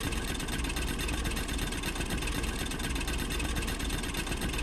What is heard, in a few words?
burner mining drill; factorio; Factorio 0.14.21